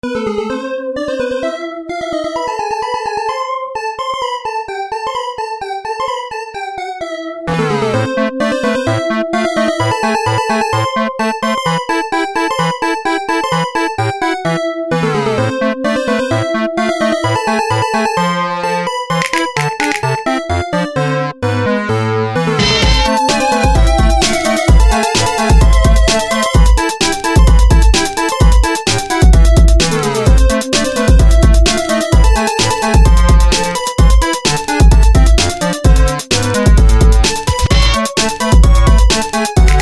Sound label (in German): dicker bass; rondo alla turbo